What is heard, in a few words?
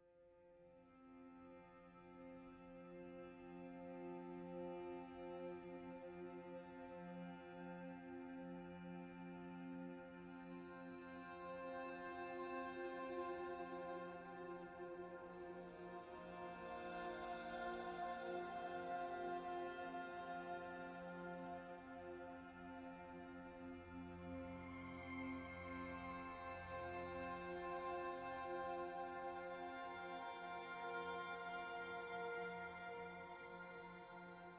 tonal Amb Full F